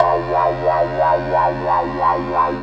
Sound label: TECHNO FX, JV-1080